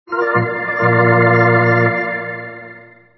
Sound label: hockey organ